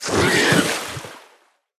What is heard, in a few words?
jump; Level27 Gillman